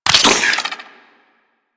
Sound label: Syringe Antidote Pickup; Missions; Raid; Golem Trials; Infested